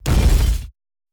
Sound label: COLLISION BASS